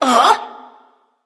confused; Smite; athena

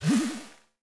Flap; smite; god cupid skin13

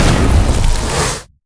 fire activate; smite; god merlin

